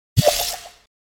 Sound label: orange portal open